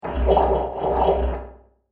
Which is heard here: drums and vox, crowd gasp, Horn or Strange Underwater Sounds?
Strange Underwater Sounds